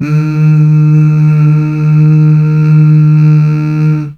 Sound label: Mmh Male, CH BACK; Best Service Gigapack 1; CH BACK6